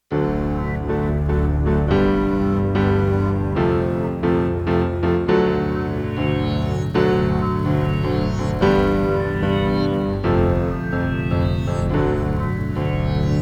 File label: piano space prelude